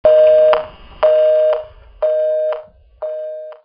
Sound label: phone busy